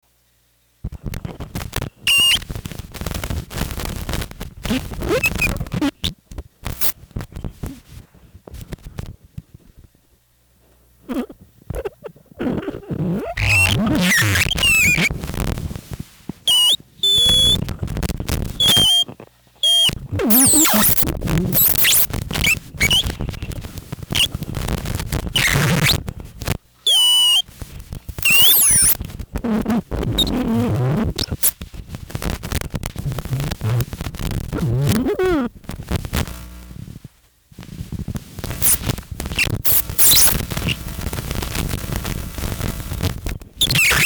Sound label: Duet for Crankmotor and Noisebox